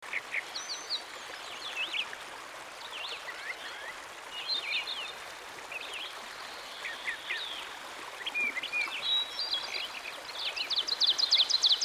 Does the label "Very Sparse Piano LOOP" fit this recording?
No